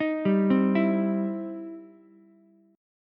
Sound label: E Minor Major 7th Sharp 5th, E, down, Ukulele; EmM7#5 arpeggiated